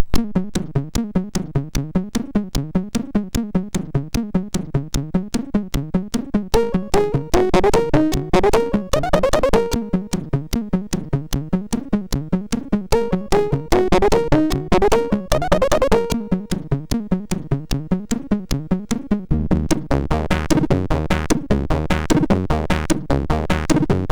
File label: sid MOS6581R4